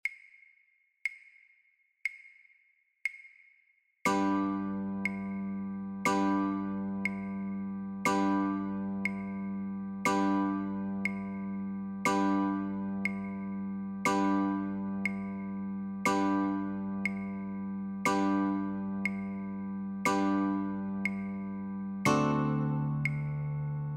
Chords, guitar; Three Blind Mice

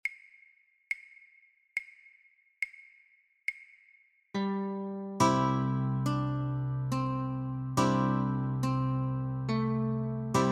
My Bonnie Lies Over the Ocean - Notes and, guitar

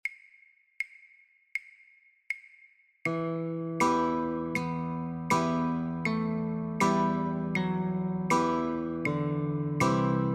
Notes & Chor, guitar; God Rest Ye Merry Gentlemen; christmas

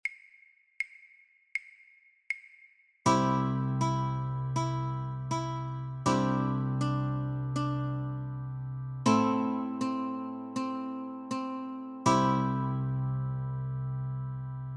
Notes & Chords, Guitar; christmas

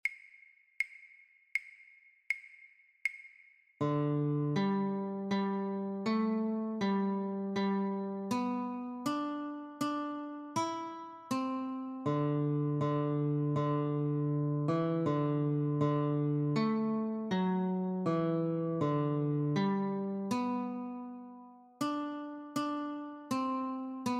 Notes, guitar; Away in a Manger; christmas

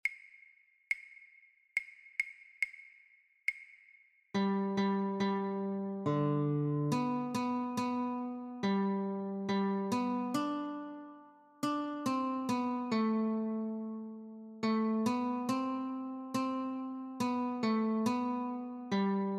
Clementine - Notes, guitar

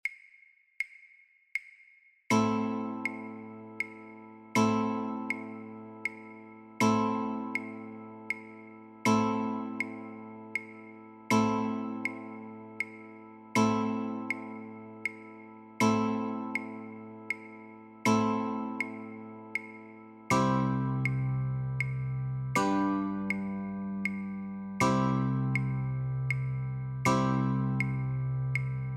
Chords, guitar; We Three Kings of Orient Are; christmas

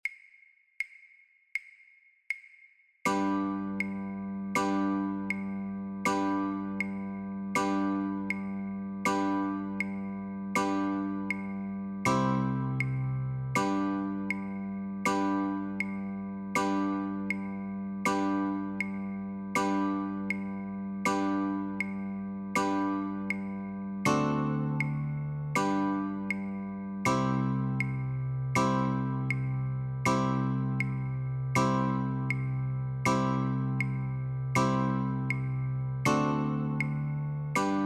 Chords, guitar; Once in Royal David s City; christmas

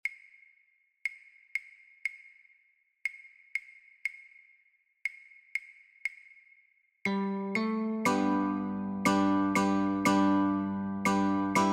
Notes and Chords, guitar

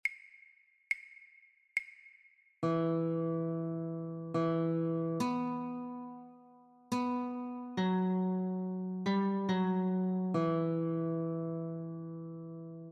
Notes, guitar

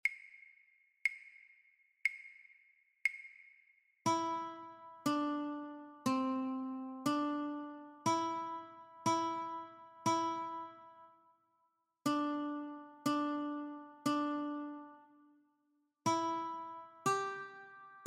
Mary Had a Little Lamb - Notes, guitar

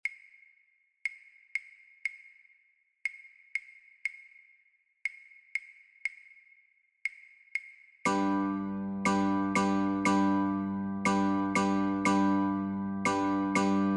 Chords, guitar

